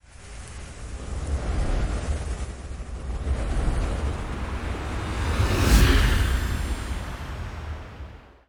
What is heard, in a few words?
woosh